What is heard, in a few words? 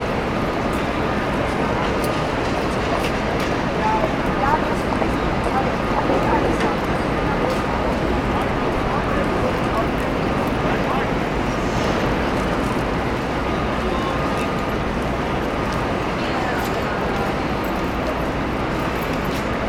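ambience urban city nyc wall street exterior; sound effects one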